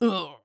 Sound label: infantry Death; PlayfulTreeKingdoms